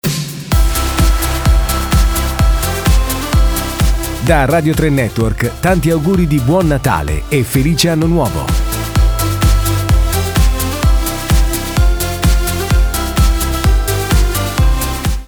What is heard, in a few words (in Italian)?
Jingle Natale